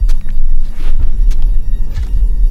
mauling loop; svencoop; keenhalloween

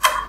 plastic cup; svencoop

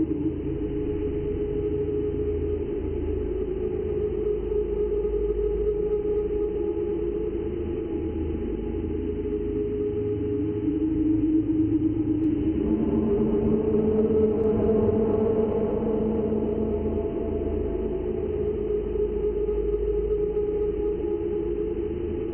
alien hum loop; svencoop; rngremake

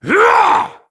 horseman attack; svencoop; keenhalloween; horsemen

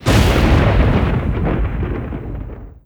thunder heavy, thunderstorm; l4d2

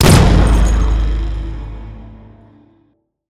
railgun fire alt, weapons; svencoop; rngremake

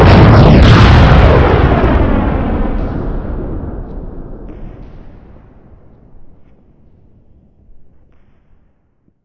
rocket ignite; svencoop; rngremake

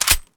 ammo pistol; svencoop; rngremake; items

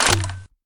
ammo shotgun; svencoop; rngremake; items

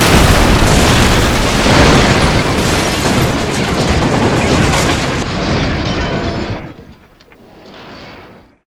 rocket break wall; svencoop; rngremake

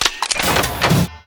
item use; svencoop; rngremake; items

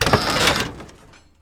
ancient plat open, doors; svencoop; rngremake

